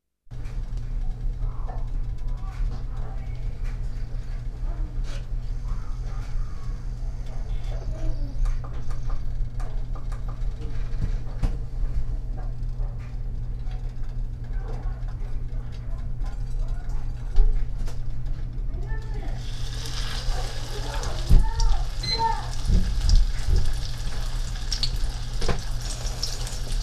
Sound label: Kitchen Noises; Richland WA